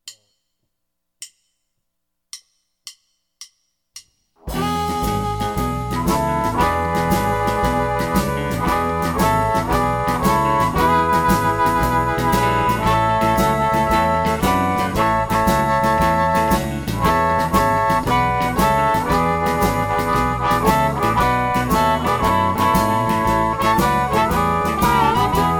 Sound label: easy harmonies in e, harmonica